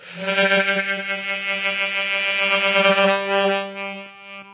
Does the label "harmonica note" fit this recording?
Yes